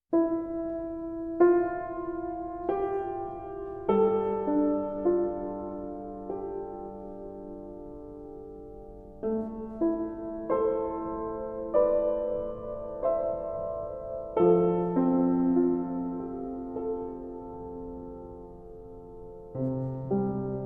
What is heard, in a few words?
Piano Solo